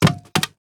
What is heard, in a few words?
Wood; Ed Edd n Eddy; Adromulus Pack